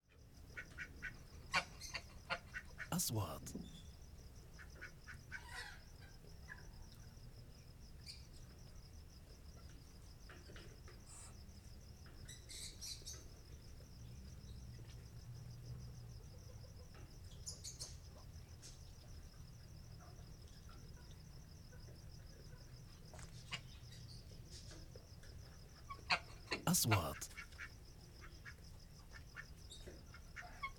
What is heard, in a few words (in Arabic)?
أجواء حظيرة البط والأوز; sound effect; room tune